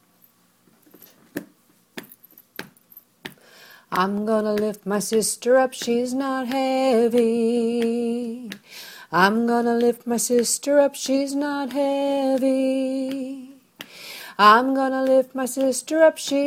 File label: Lifting Chant; rituals